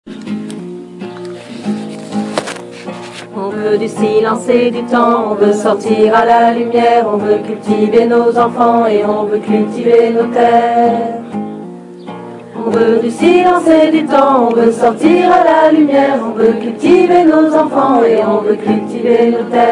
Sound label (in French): Basse